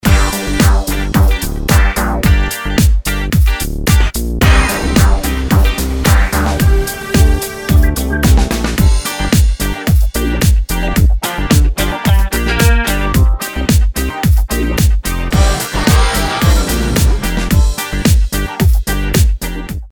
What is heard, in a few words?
POP